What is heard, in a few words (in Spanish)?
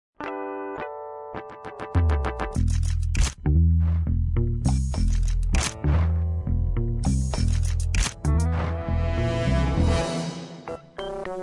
musica